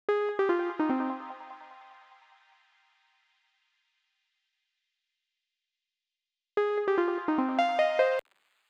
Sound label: Bell, 148 BPM F Maj; Cymatics Gems Vol 5; Astro